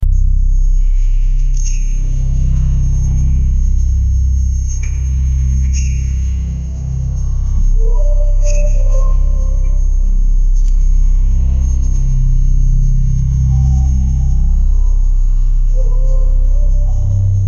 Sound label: horror ambience with